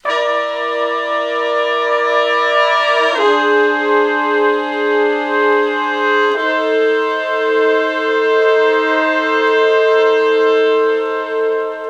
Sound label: Slo Blues Chords [V, IV, I, I] ( F), Horncraft for R&B, Sections; Crimson Blue and Fabulous Horncraft 4 RnB